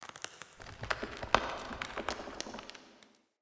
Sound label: Wake Up Loop Root Creak; Cinematics; Bromius; Bromius Quest Wake Up Loop